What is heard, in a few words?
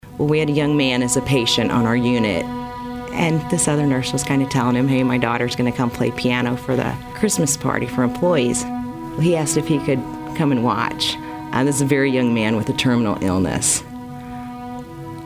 Piano Player; St Johns Hospital